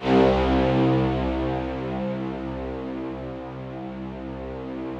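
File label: Rough Strings C1, Yamaha VP-1; Music Store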